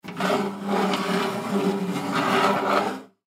Film Light Move, Film Light Arri Halogen Move Gates; Miscellaneous Electronic Sound Effects; Foley; SoundEffects-Blastwave